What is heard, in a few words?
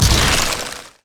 ice shard; LoL; lissandra